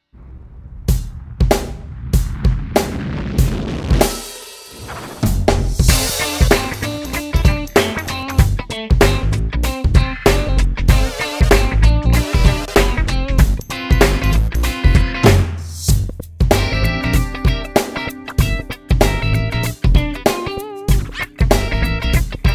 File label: e656 funk; ENGL